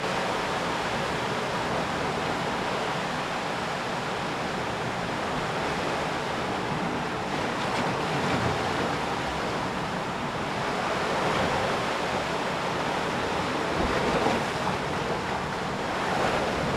sea sounds; chibi robo photo finder

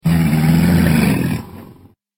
Wake Up

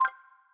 crystal reward tick; Idle Champions of the Forgotten Realms

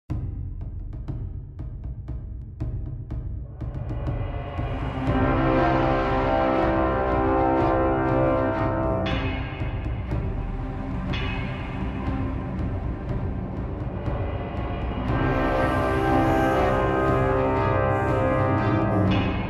Amb Hades; Titan Quest Immortal Throne

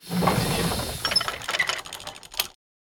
closing lotus door full; through abandoned the underground city 2015 gamerip pc macos

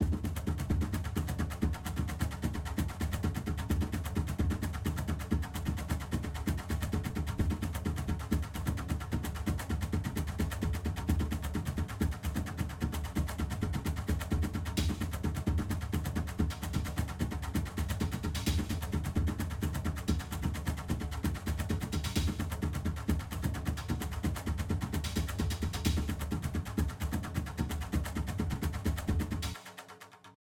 Mus Action Perc Low 130; moons of madness

